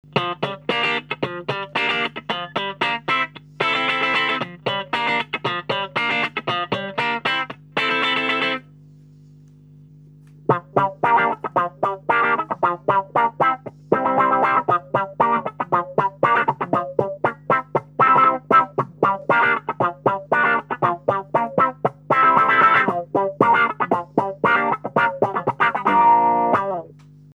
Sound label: Auto Wah